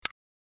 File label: Assault rifle fire selector; gfxsounds